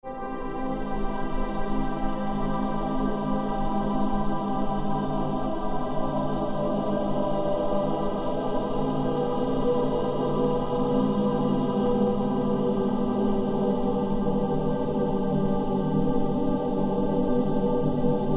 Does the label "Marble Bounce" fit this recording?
No